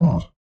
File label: ogre roar